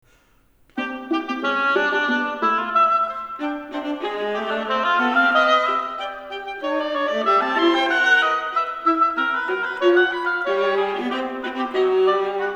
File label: Fanfare for Oboe and Viola